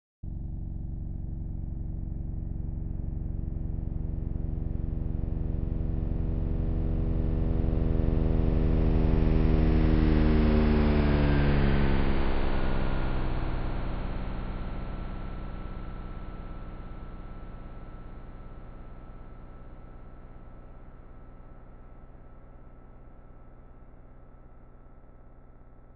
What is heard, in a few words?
auralized Flyover